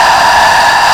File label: Breath; Xfer Records Serum; Serum Presets